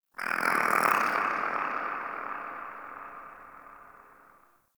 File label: Ext Creature Distant Mid; Misc Outdoor